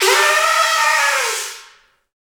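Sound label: wind bull, Africa, Afro horns, bullhorn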